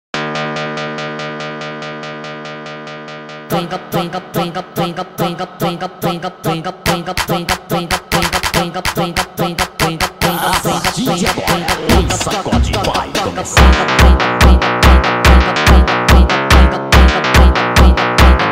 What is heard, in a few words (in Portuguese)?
Automotivo sped Up